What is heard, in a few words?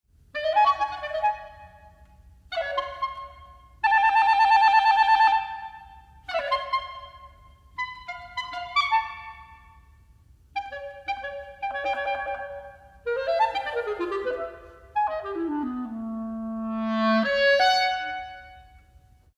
birdsong